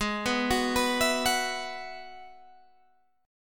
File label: Ab Minor 7th Sharp 5th Ab, Guitar Standard; arpeggiated; JGuitar